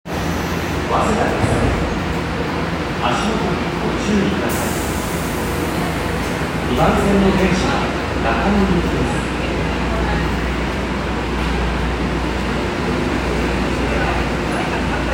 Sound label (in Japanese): 東西線早稲田西